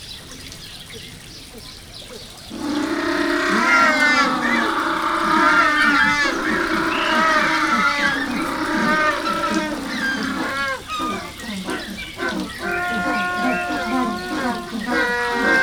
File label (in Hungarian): vízzuhatag nélkül, röviden, pápaszemes pingvin; jaszberenyi zoo 2015